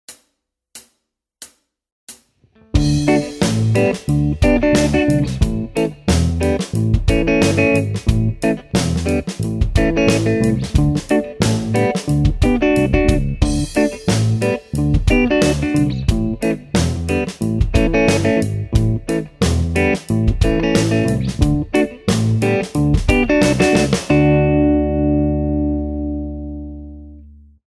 Slide backing, Guitar Techniques; Guitar Techniques 2018 Issue 289 December

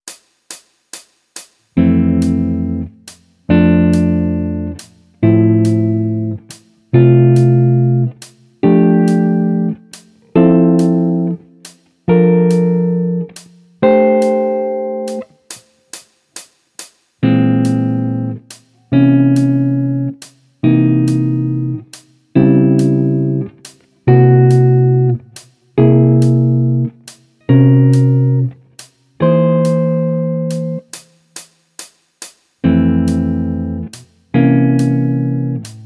Jazz; Guitar Techniques 2019 Issue 296 June

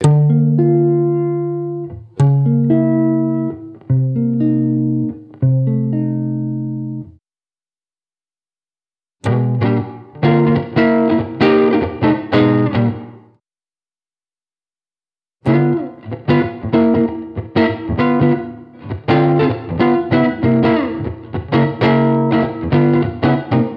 Jump Blues; Guitar Techniques 2019 Issue 296 June

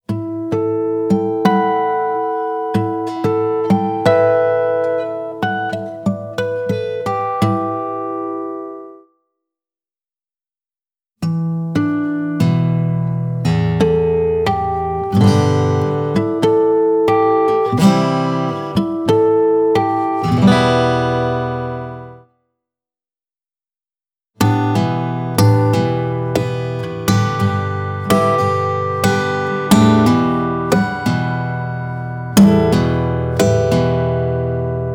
Acoustic Strum, Tap, Slap; Guitar Techniques 2018 Issue 289 December

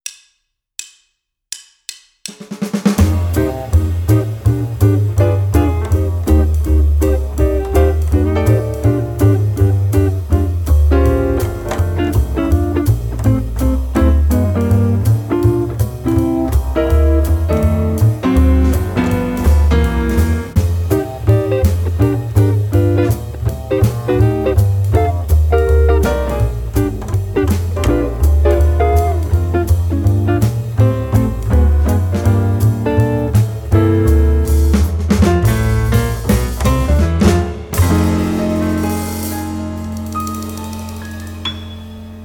Rhythm Guitar

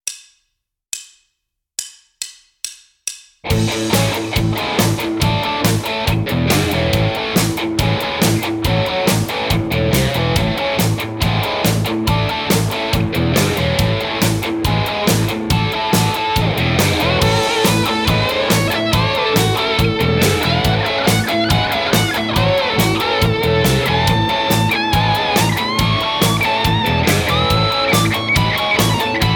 jam track; Guitar Techniques 2019 Issue 290 January

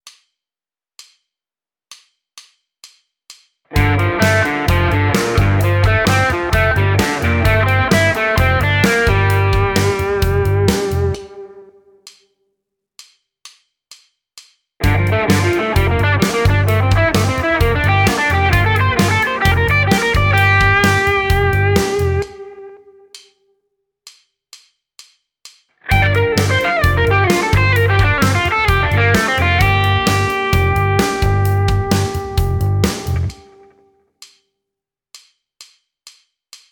Blues Arpeggios - Triads over Gm7, Guitar; Guitar Techniques 2019 Issue 297 July

